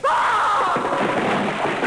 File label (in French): chute